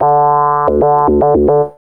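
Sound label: synth riff; E-Lab; X Static Goldmine 1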